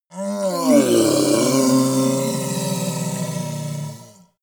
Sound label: monster dark growl hoarse; kreaturen